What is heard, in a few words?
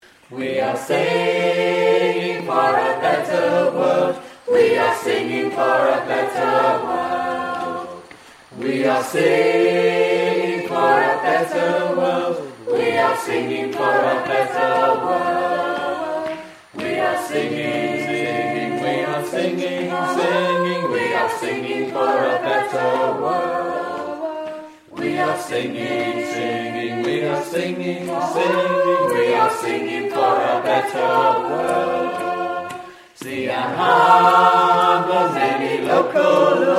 Bass